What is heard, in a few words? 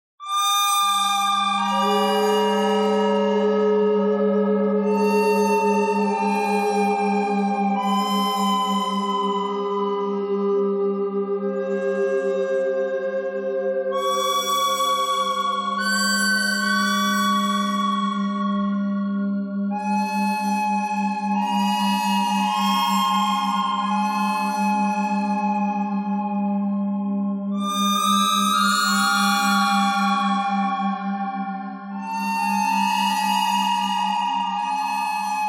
bird thread harmonic; noreverb